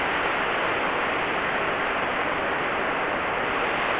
sparse soundscape samples; ReLooped

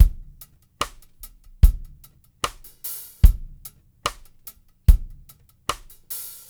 DRM DRY; Spectrasonics; Liquid Grooves 1 & 2

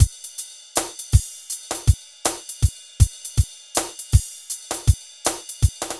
Synth Loop, BPM 80

